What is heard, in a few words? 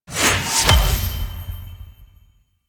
UI Reward Screen; DE; Warframe; Reward Popup